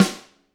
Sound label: GRETSCH SNR; ILIO; Double Platinum Drums 1